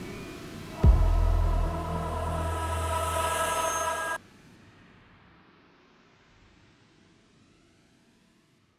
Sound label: stinger; Sifu; Music Hideout2; stinger Flashkick; IntroPit